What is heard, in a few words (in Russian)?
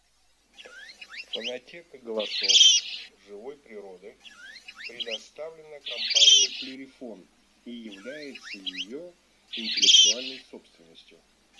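Рябчик Francolino, манок; plurifon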